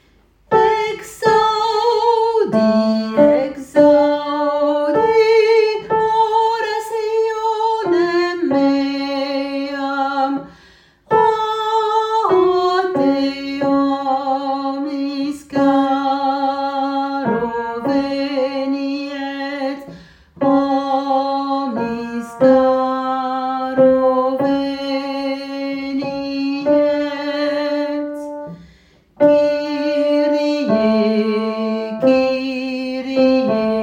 Kyrie alto

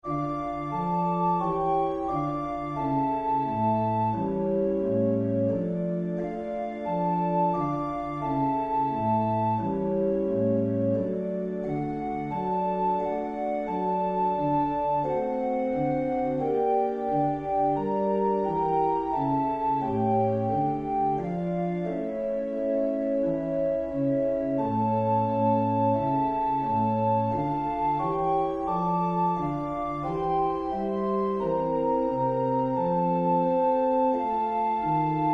Sanctus Quadragesimae Organ

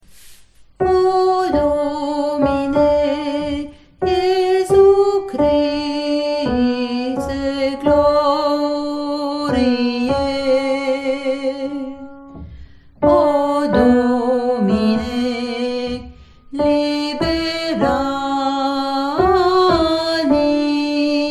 Offertoire Alto

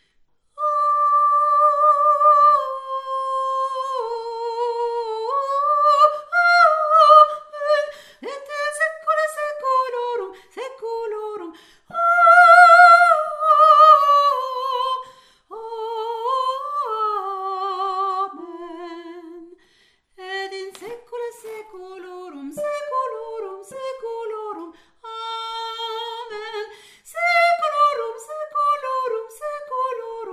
et in saecula voix

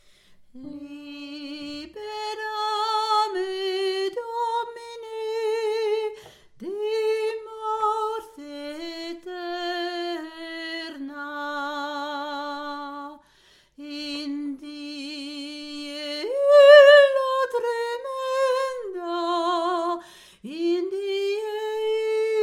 Libera me unisson voix alto

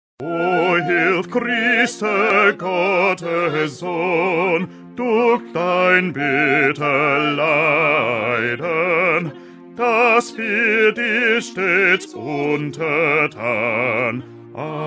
O hilf Christe Bass

